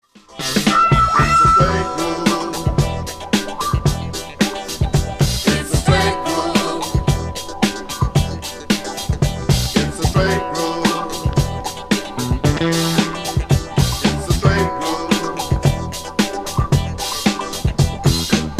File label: Straight Groove, Funk